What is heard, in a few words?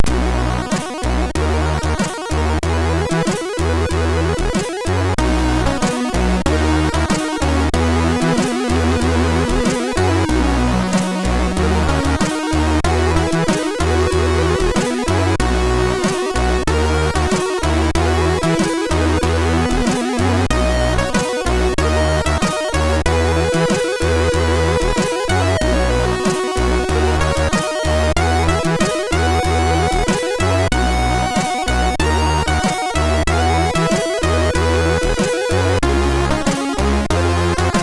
sid MOS6581R3